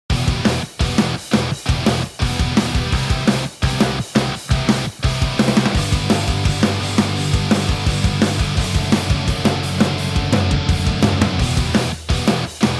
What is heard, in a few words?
Variating Rhythm